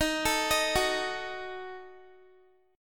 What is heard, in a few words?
D sharp Diminished D sharp Guitar; arpeggiated